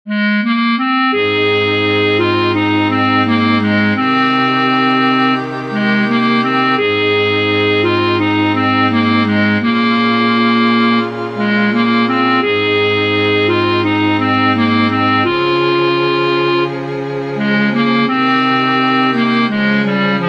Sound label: An Irish Air Clarinet; Written for our band's third clarinet section who all prefer not to play above "the break" (Bb3).